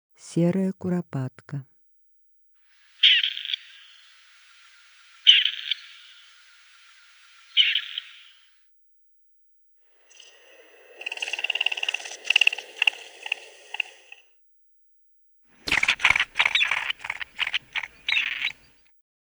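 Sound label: seraya kuropatka voice, grey partridge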